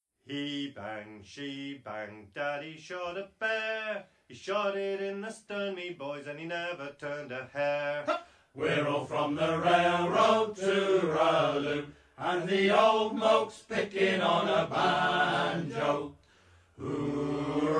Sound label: pickin on a banjo